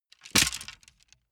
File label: wood sticks small break